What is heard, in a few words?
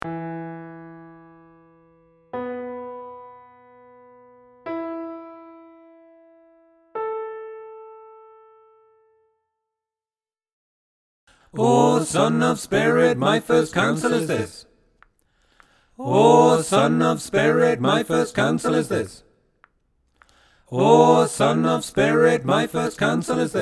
tutti, choral music